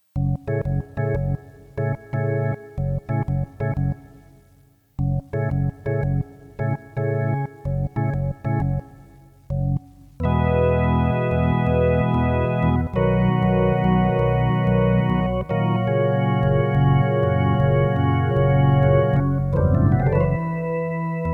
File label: space organ